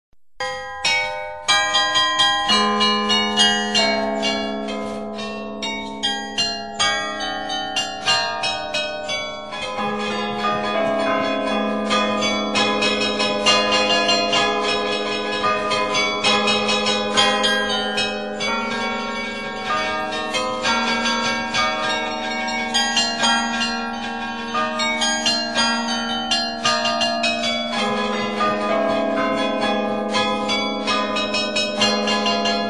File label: carillon; carillon stjean